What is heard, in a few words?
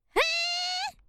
malding noises